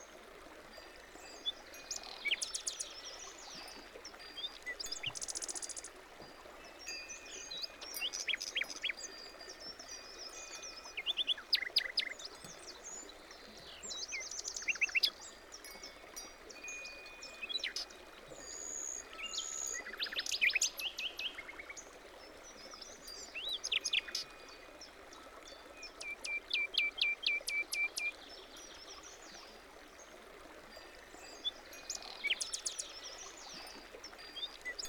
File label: naturesounds